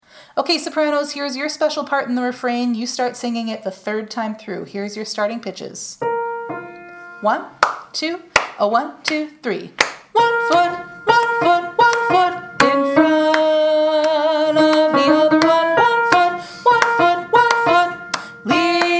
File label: SOPRANO Teaching Track; ACTS Thanksgiving Practice Tracks